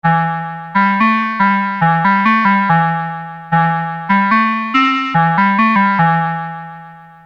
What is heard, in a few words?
Ring Mod